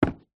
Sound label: footstep single wood decking boot; foley; zapsplat